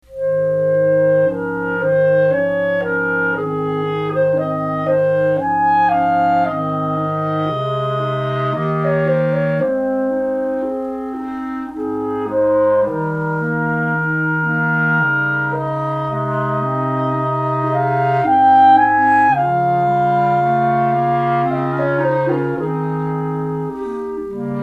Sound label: Quartet, clarinet; Crusell